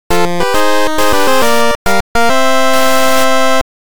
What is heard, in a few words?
8bit; sentou syouri